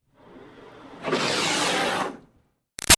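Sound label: Water Spray Interior Car Grill Med; Car Wash; BigRoomSound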